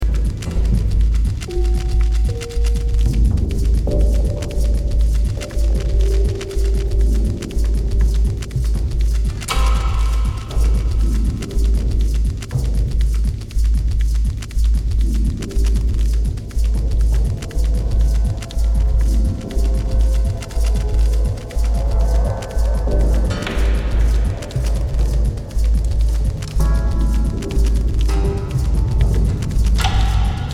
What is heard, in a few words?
Error Improvisation